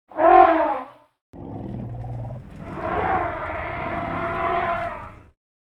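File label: elephant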